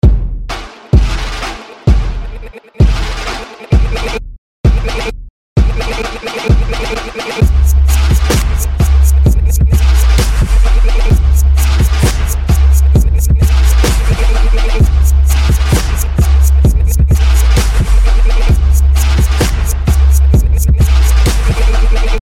drum roll tone ringtone

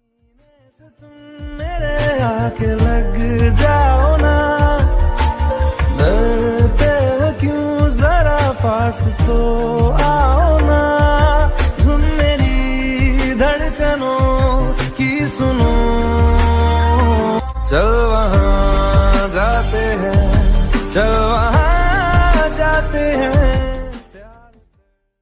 hindi love bollywood ringtone

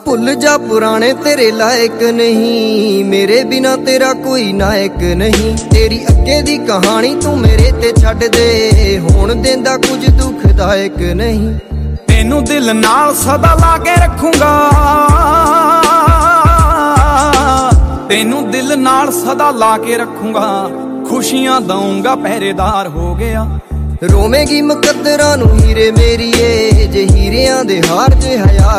cartoon punjabi song ringtone

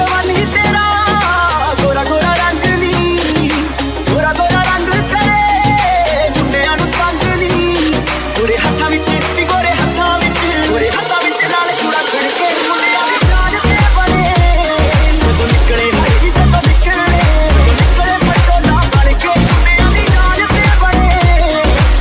punjabi sweet ringtone

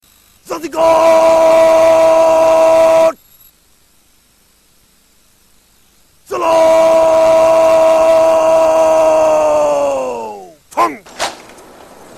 patriotic ringtone; pakistan army

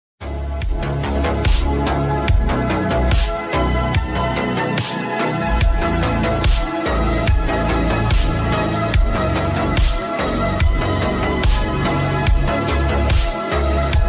ringtone, english

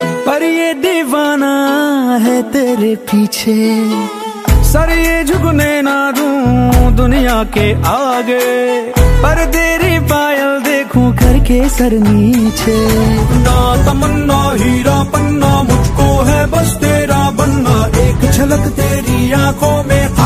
hindi ringtone

